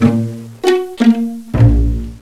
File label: ENSONIQ PIZZ STRINGS